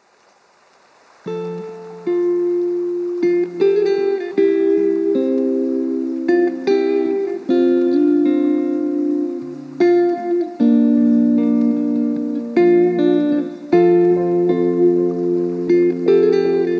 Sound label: lofi hip hop